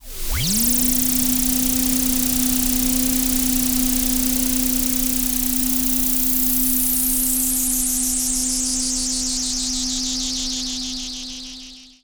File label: Roland E Noise, Roland S100; musicradar-synth-samples